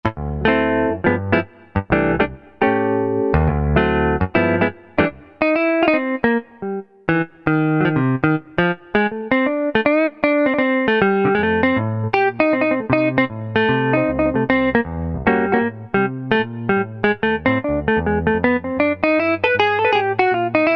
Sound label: Crying jazz; Country; Roland SR-JV80-17 Country Collection